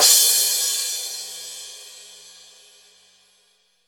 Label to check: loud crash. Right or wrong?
Right